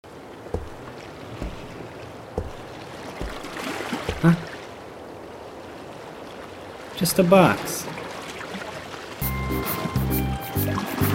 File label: Box; Docks